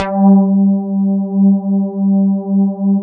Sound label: SYNBASS; Synthesizers; Soli-Music; World of Synthesizers VOL-1